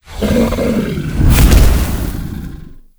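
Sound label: lava mino death, monster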